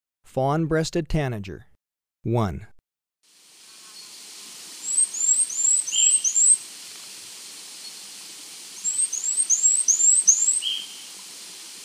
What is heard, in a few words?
Fawn-breasted Tanager, Birds of Ecuador; S. America